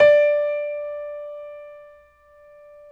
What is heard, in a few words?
PNO Grand Pno; Club-50 - Foundations Roland